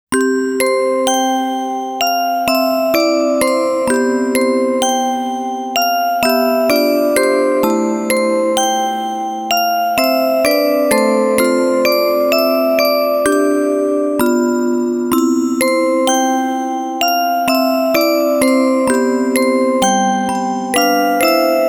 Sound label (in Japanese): オルゴール ver.; Neko Masshigura